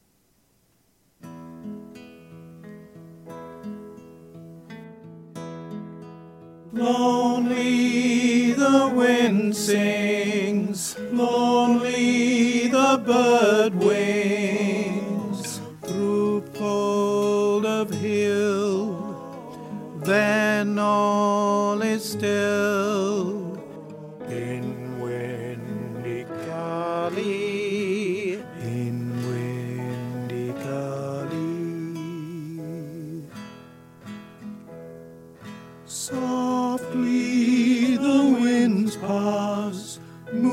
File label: windy gully lead vocal sung